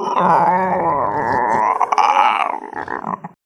female zombie idle; nmrih; zerosiki; slender

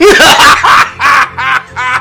um hahaha

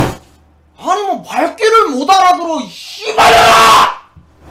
scout negative vocalization

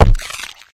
footsteps, run

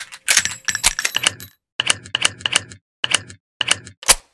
reload; cof; purnell

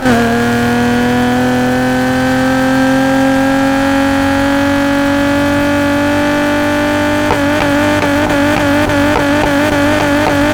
fourth, vehicles; 350zra; nfs heat ganimator pack

